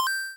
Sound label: Coin